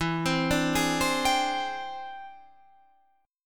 E 7th Sharp 9th Sharp 5th, chord

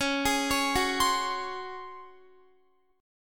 Db Major 7th Suspended 4th, Guitar; DbM7sus4; arpeggiated; tuning=4E,4A,5D,5G,5C,6F

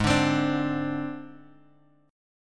G sharp Major Flat 5th, chord; G#Mb5 Chord {4 5 X 5 3 X} strummed

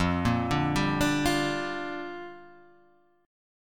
A sharp 6th F

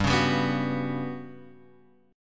G Major 7th Flat 5th

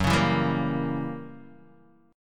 F sharp 7th Flat 9th F sharp down Guitar Open D

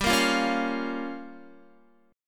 G-Minor 7th Flat 5th, Guitar, Gm7b5 chord; strummed; JGuitar

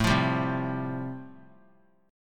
A-Minor 7th Sharp 5th, chord; Am7#5 strummed; JGuitar

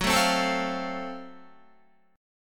Gb Minor Major 7th Flat 5th, Guitar; strummed; 13,12,12,14,12,13; 4F,4A,5C,5G,5C,6E

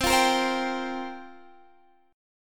C 7th Suspended 4th, Guitar, Standard; C7sus4 strummed

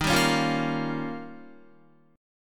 D sharp 9th, Guitar; D#9 strummed; JGuitar

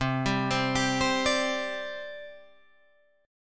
C Suspended 2nd, C, down, Guitar, Drop D

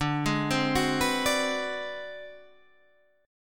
Ab 7th Sharp 9th Flat 5th D, chord, guitar

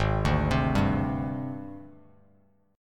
G sharp Major 7th Flat 5th Bass Standard; 4,5,10,0; JGuitar

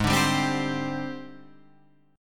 Ab Major 7th Flat 5th Ab, down, Guitar, Drop D

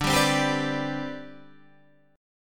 C Major 7th Suspended 2nd D, chord

